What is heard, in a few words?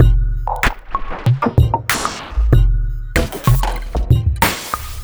Mono Crunk, Drum Loop; Neptune Mono Crunk; Sonic Foundry (Sony Creative Software); The Electronic Music Manuscript Collection; Drum Loop Structures